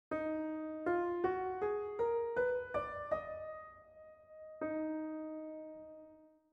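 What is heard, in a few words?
Eb minor scale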